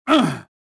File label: pain; rebel